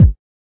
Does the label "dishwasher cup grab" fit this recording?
No